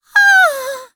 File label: Female Fight Death; mighty party 2017 gamerip pc ios android